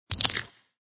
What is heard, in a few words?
fruit Miss; fraction feed the frog